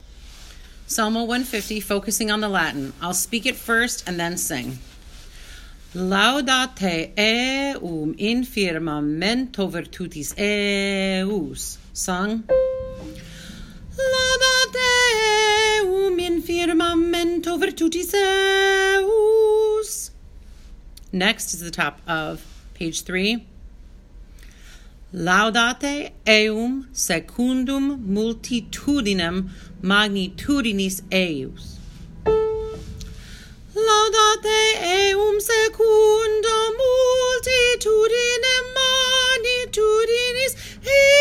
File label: Salmo 150 Latin Soprano